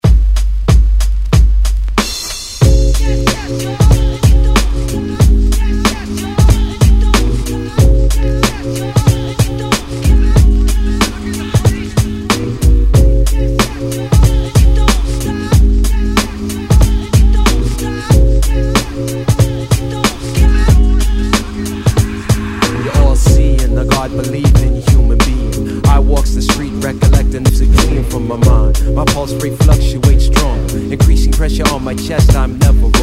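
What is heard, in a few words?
hip hop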